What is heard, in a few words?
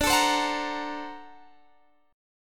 D 7th Flat 13th D, chord; D7b13 strummed; JGuitar